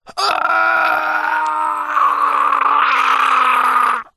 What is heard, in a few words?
human male strangle vocal; zapsplat